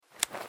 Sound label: Flash off; The Joy of Creation Halloween